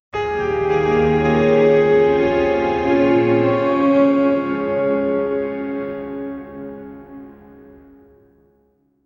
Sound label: light orchestral calm; human voice